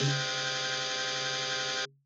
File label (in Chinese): 电吉他 C5; th13